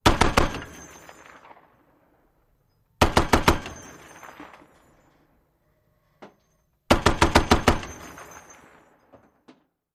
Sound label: .50 Caliber: Short Burst With Heavy Shells Dropping